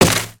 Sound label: Generic On Hit With Effect; creatures organic